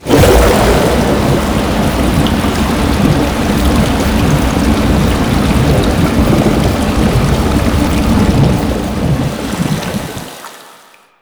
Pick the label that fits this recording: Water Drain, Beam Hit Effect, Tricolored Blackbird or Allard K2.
Water Drain